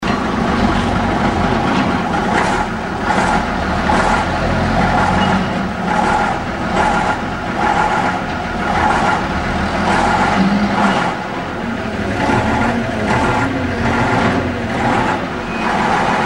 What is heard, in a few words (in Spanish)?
SONIDO PEGASO 3046 ACELERANDO, camion; arranque, acelerando; T. T BOMBEROS; en marcha y sirena